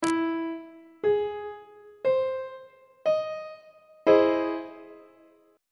f flat augmented major 7th chord on treble clef

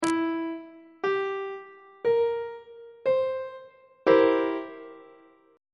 c dominant 7th 1st inversion on treble clef

